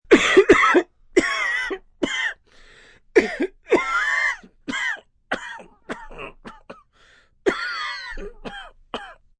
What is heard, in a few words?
Cough Sick, Cough, male, sick, cold, covering mouth, sick (human voice); Clearing Throat